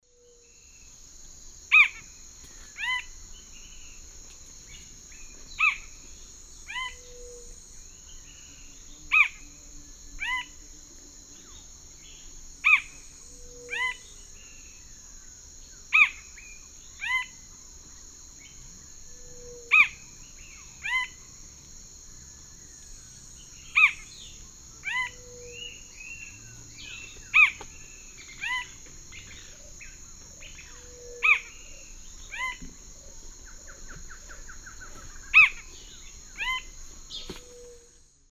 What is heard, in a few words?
Synallaxis gujanensis, song; S. America; Eastern Lowlands